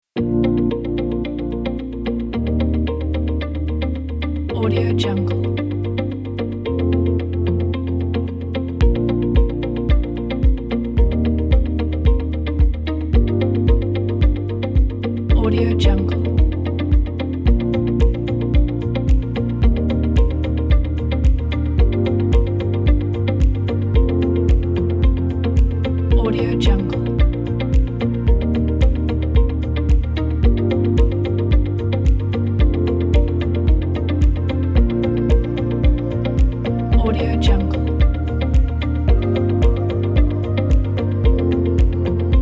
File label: chill travel background